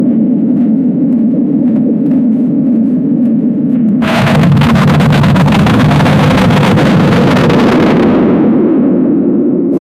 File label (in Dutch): raketlancering waarbij bo, launch